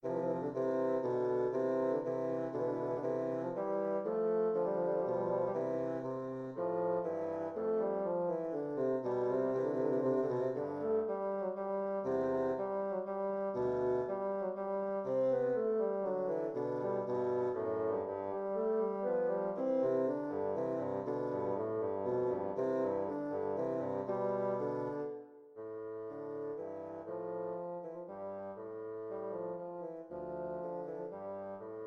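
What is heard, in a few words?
duet in C major 2 bassoons; hwv 592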